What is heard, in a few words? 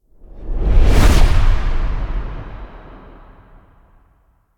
Whoosh; Warframe; Jade Shadows; Quests; Escape Cinematic